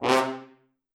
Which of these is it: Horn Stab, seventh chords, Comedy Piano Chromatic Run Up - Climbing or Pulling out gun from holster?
Horn Stab